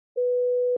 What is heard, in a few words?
Censor Bleep 1kHz